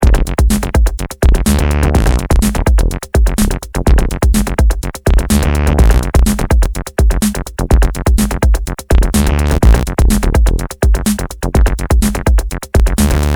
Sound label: 303 break